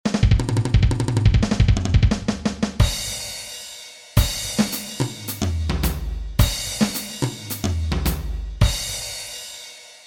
fill ins und grooves

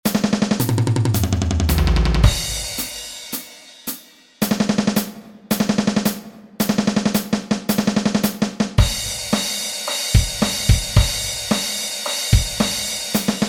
schlagzeugsolo fur anfanger

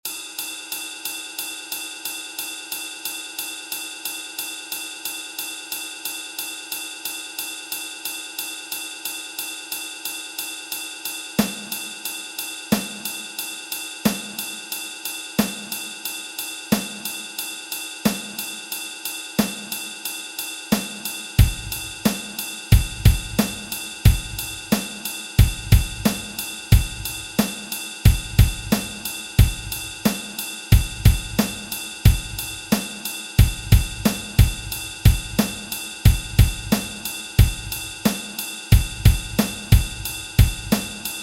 grundrhythmus

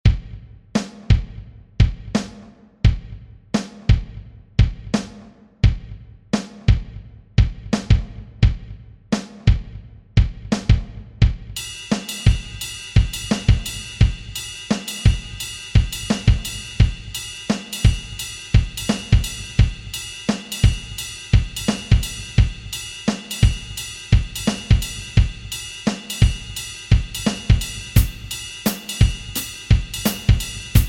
der perfekte groove

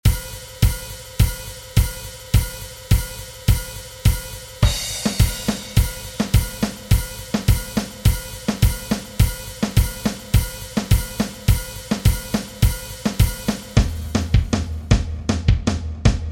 schlagzeugsolo fur anfanger

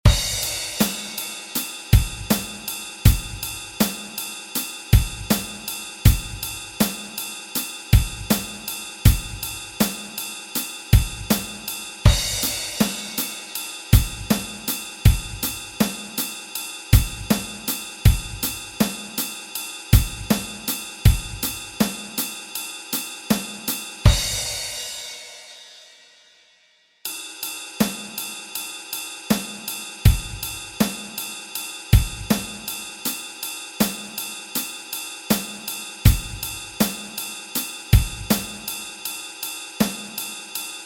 hihat begleitung

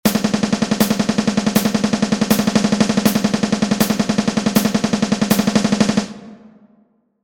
interessantes hand warmup

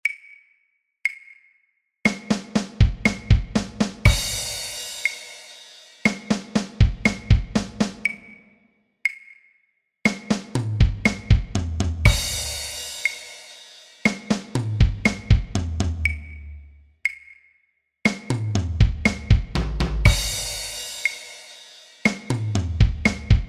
einfache drum fills langsam

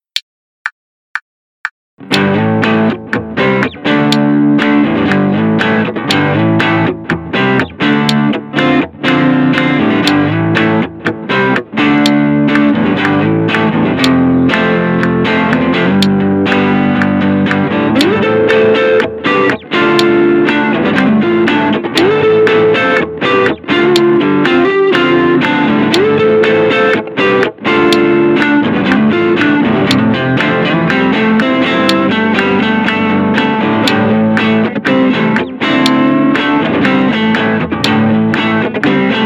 playback schnell tempo 121

